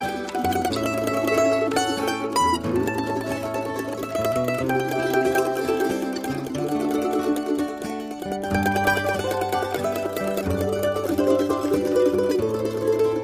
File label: Waltz